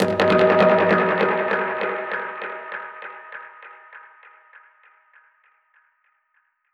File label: Perc Hit, dub percussion one shots; musicradar